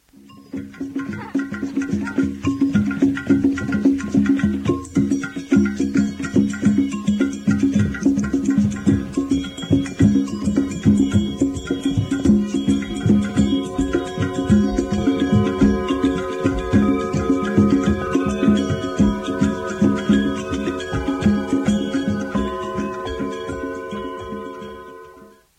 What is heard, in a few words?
drum